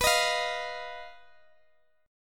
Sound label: B Major Flat 5th, Guitar, DADGAD